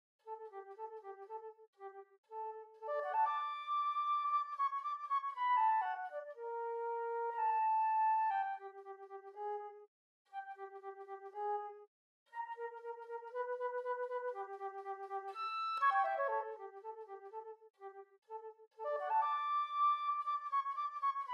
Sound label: Flute; Nutcracker Suite Dance of the Reed Pipes